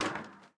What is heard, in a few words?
Ladder Step; Game rip; MiSide